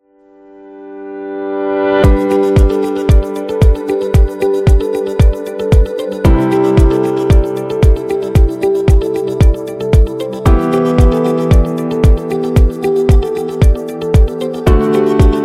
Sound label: Healthcare Corporate; corporate music vol 12